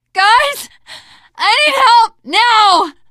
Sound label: dying, teengirl survivor voice; source engine; left 4 dead 2